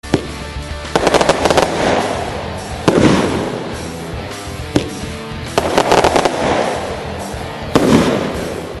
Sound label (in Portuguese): foguete